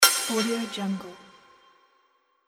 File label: metallic magic light tool hit; mountainaudio